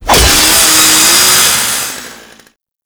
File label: Saw Impact Default, Blade Impacts; Warframe; Grineer